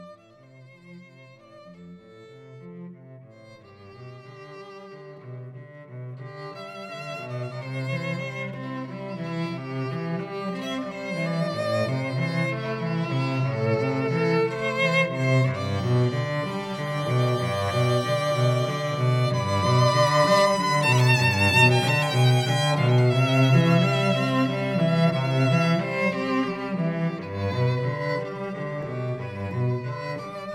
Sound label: duo Violin Cello